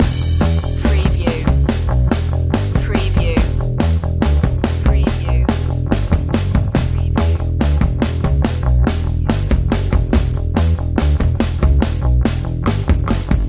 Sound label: hippie loop